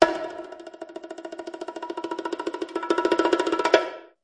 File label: bongo crescendo; Cartoon FX